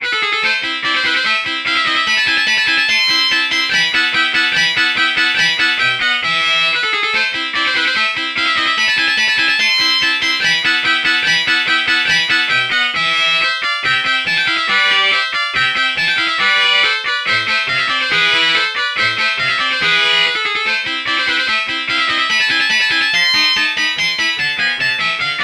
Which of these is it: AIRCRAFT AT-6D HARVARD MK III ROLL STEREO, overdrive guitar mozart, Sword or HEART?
overdrive guitar mozart